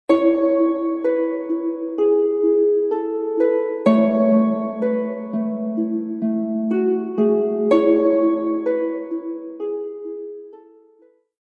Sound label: Ancient Calm; Civilization Revolution; xbox